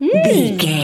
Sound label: voice fun small character emote happy